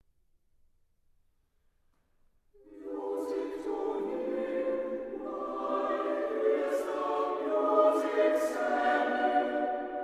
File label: vocaal ensemble; paniek